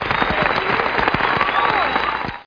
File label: CLAPPING